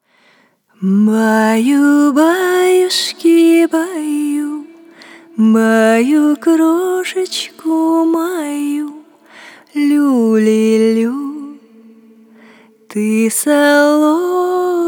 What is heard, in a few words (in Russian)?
Русские народные колыбельные, Соловушка